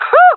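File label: cheer, jungle female; vo; agents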